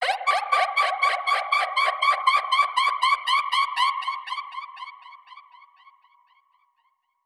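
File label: Modulation Proc, alarm siren samples, 120bpm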